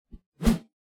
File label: melee attack swoosh heavy; weapon swooshes; psfx